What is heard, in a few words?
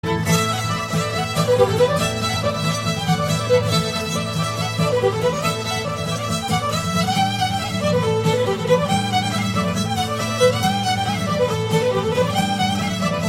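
Celtic Irish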